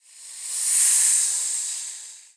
snake; cs16; ze landlubbers fabi

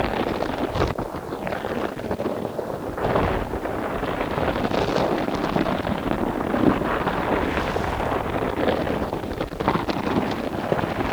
rock roll; svencoop

